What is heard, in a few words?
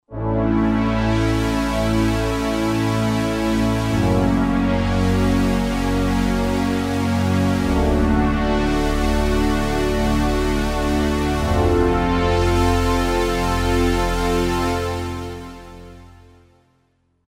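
Big Pad Sweep, Vintage Synth; Roland SR-JV80-04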